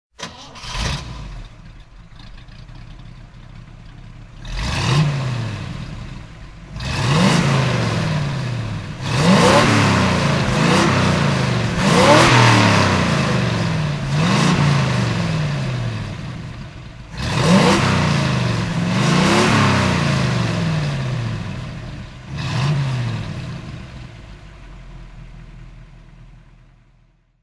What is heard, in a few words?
chevrolet corvette throttle